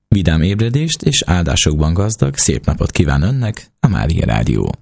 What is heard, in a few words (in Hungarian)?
REGGELI JINGLE; szignalok; SZEKELYUDVARHELY